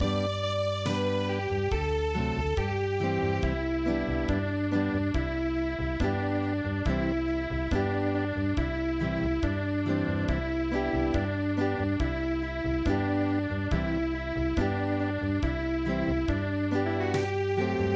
Acoustic, traditional; The Returning Hunter

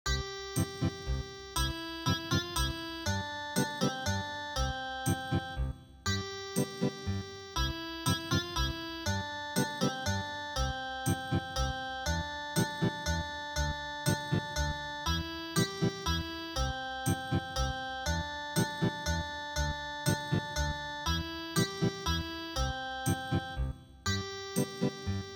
Dance Ethnic Guitar; midi; traditional

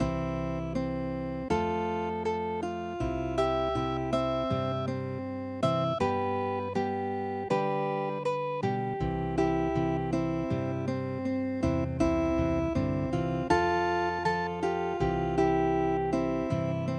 home sweet home classic guitar

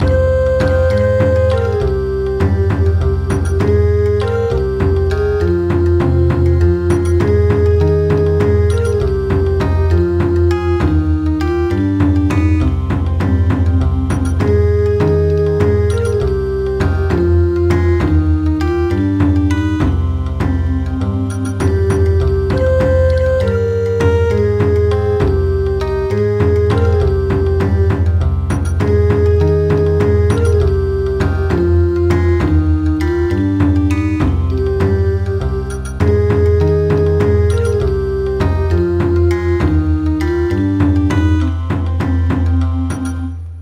Bon Odori, midi

